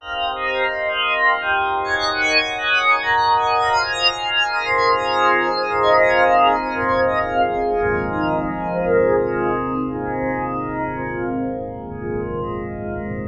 FLANG SPARKL; eShop

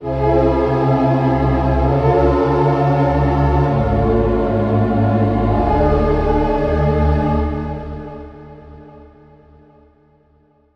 HOSE TRP VOX; eShop

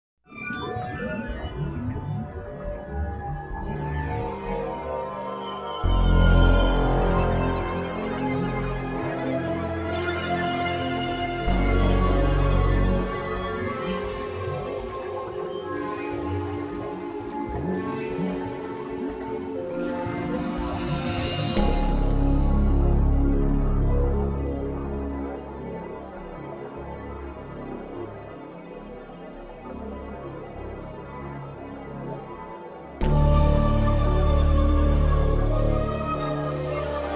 underwater; eyespy

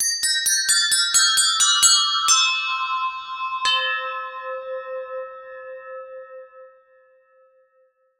BELL

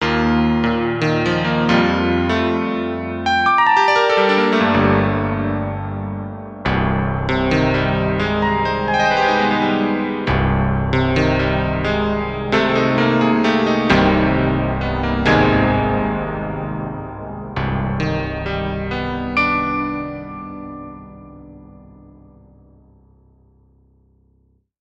CP70

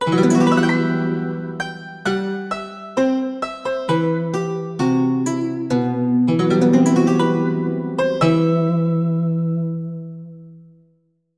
KOTO VIB; eShop

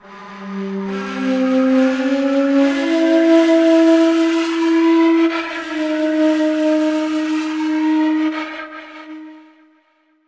air shak; eShop

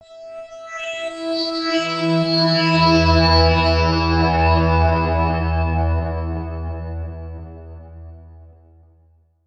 ZING pad; eShop

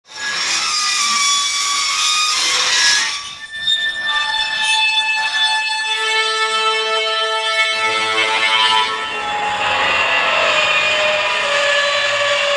SPRING STL all; eShop

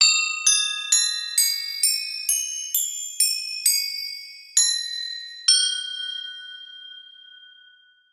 TIB BELL; eShop

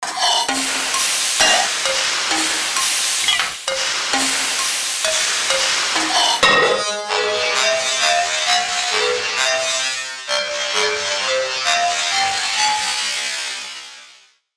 SAUCEPAN all; eShop

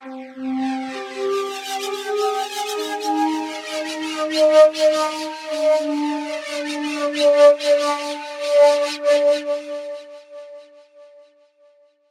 SHAK TRM; eShop

